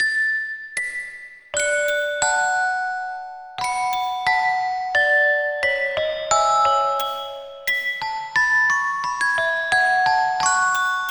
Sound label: music box Loop